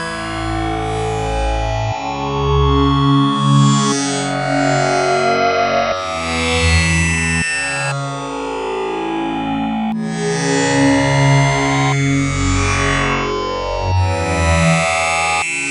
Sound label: Strange Synth, Chillout; Sonic Foundry (Sony Creative Software); Afterhours EDM; Chillout Service